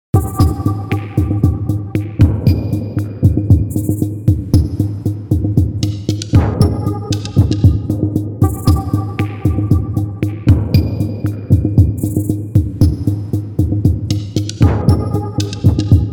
Throbe

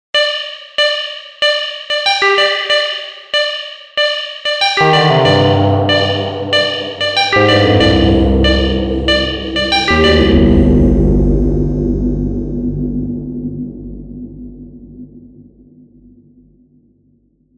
Emulator 1 modular

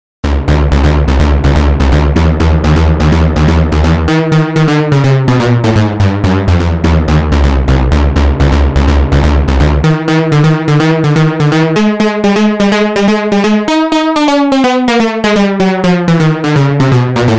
Rivjern bass

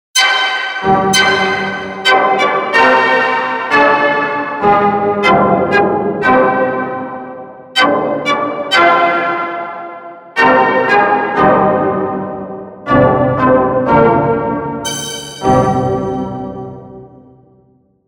Dream sound

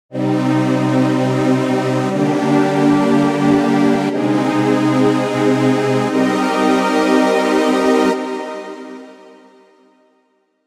Deep strings mellow

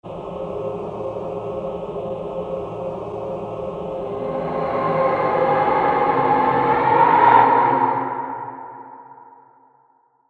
Bended voices

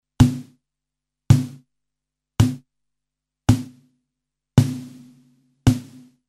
snare

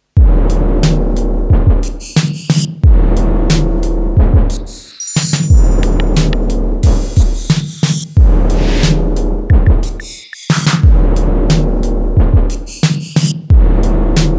Reverberator

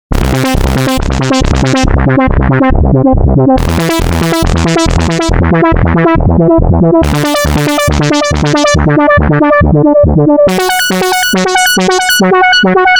Mushroom bass

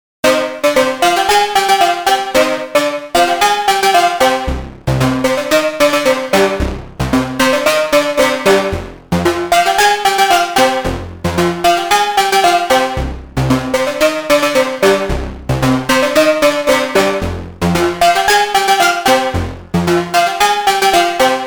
lead and elektroskjelvebass; Geisha

